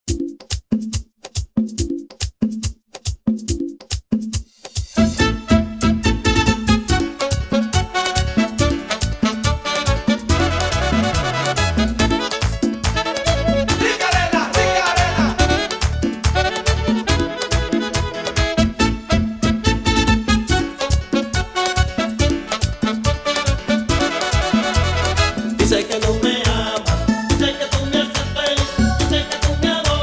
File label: Remix bpm 140